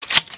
ksg12 insert

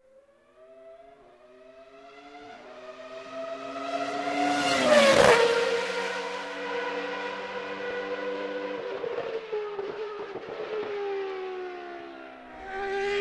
engines

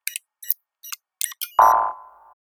key error, buttons

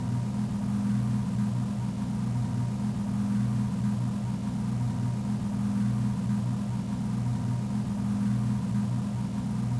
idle, weapons

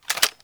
mp5 mag out

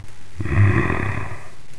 strike

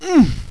alpha pain; paranoia

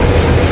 bigfan

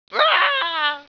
rrreeeaaah, yells

